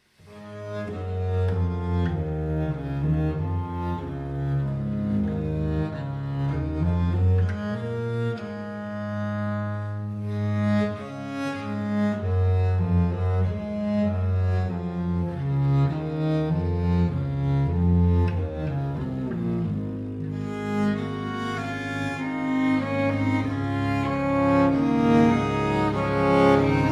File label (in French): quatuor en si mineur choral